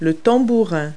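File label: tambourine; Multi-Lingual Talking Picture Dictionary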